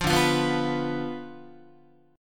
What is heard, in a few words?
D-Minor 6th, D, Guitar, 4A, 4E, 5A, 5D, 5G sharp, 6C sharp; Dm6 strummed; JGuitar